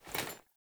Foley Action Default Melee Swipe, Foley Default Clothing; Characters; Player